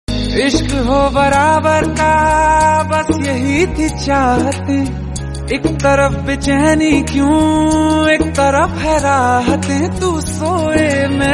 romantic hindi

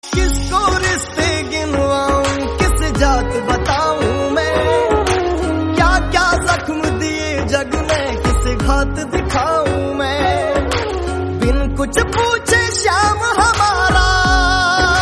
rajasthani song

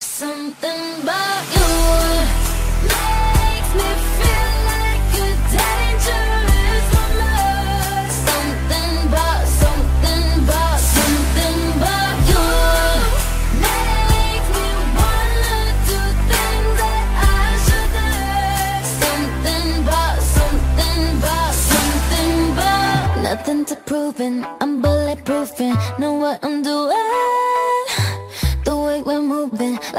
english song

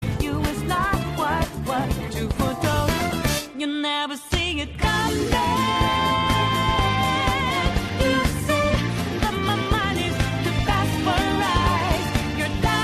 heartbeat heartbeat

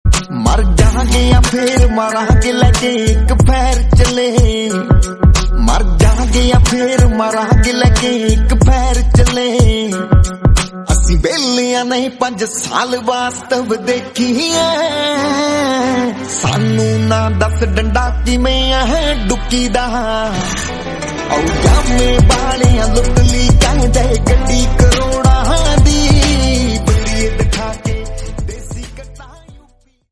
punjabi

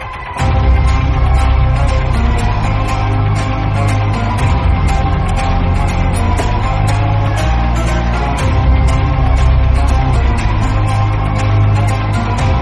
attitude bgm; kgf

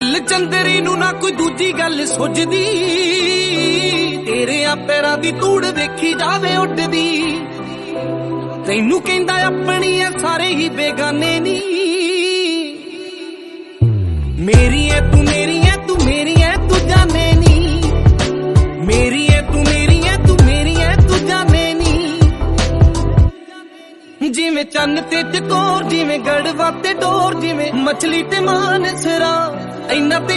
punjabi song

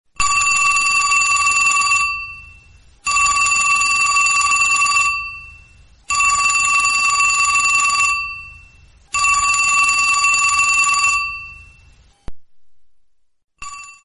Old Landline Telephone

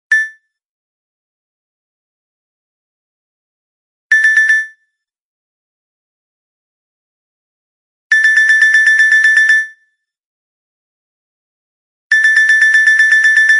nokia x7 clock

